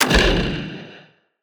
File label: Antihero Attack Impact